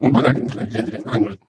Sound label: ballbot chuckle